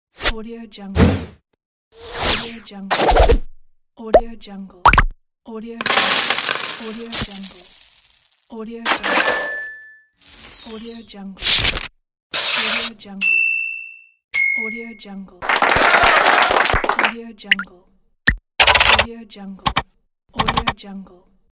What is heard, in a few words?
infographics video effects pack